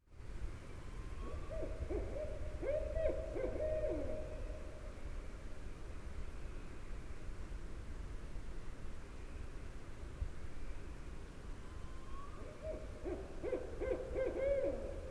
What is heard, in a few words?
barred owl